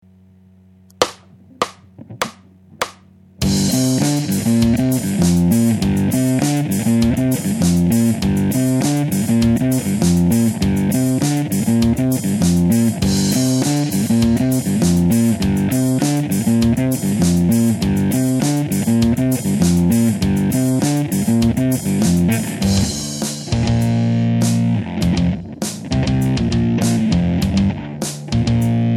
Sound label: G octave noodle; The Other Noodle; Ubershnacke Productions